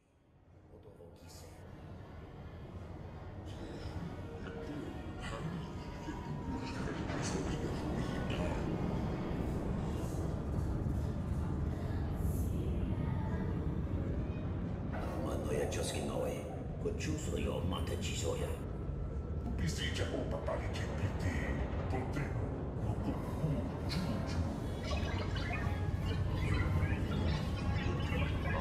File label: amb workshop; ambiance; environment track